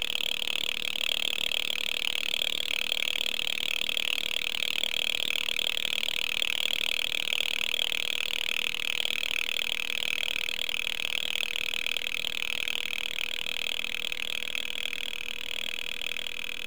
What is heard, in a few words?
beep